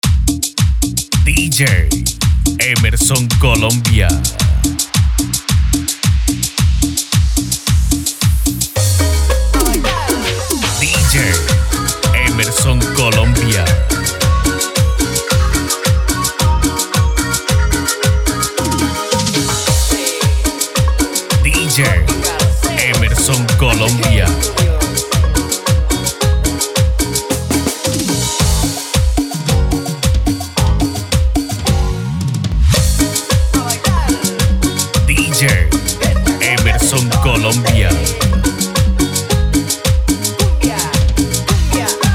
Remix Cumbia Brava

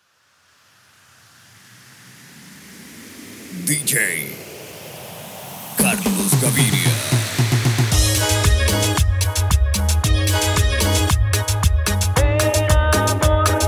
Afrosound

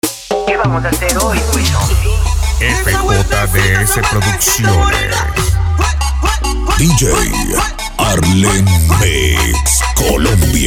Electrocumbia

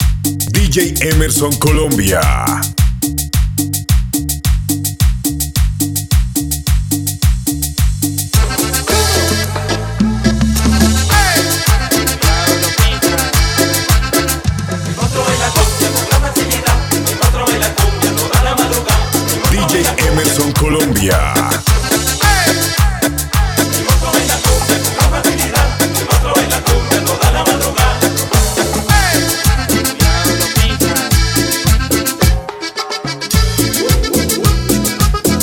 Remix Cumbia

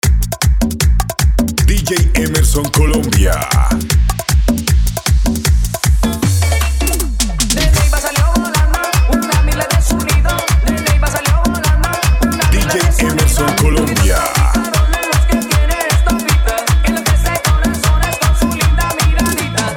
Mix Banda

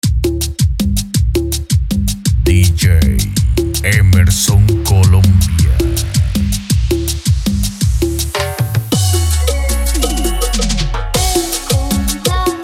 Version Cumbia

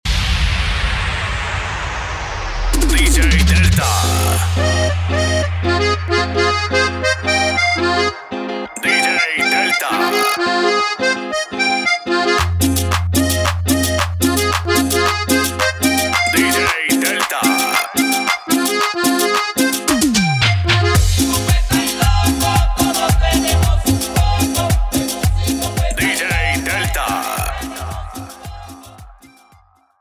entrada cumbia

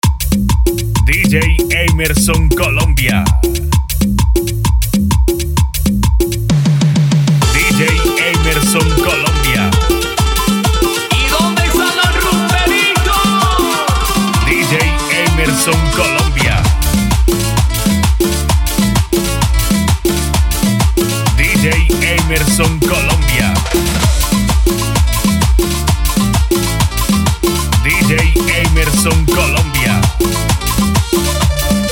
entrada basica guarachita tribal

